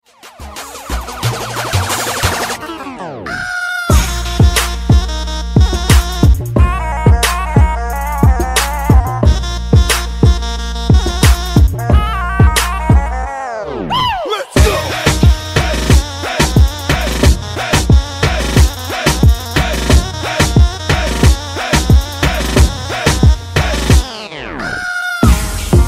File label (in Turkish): Telefon Zil Sesleri